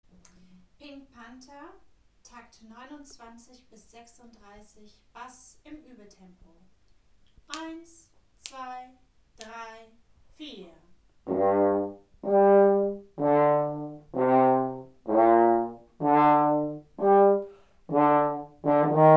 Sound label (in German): Stimme 4 Uebetempo, Posaunenchor